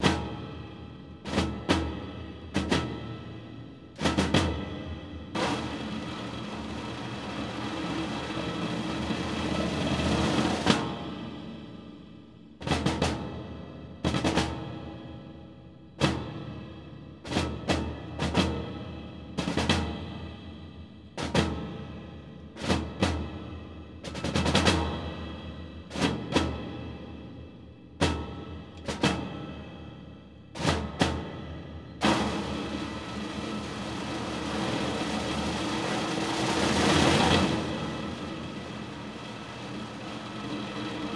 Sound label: Ambiance Stereo Snare